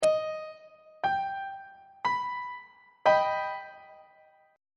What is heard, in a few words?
f double sharp augmented 2nd inversion on treble clef